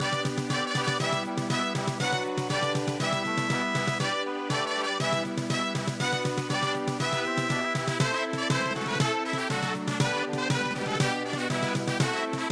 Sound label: March, Brass Band March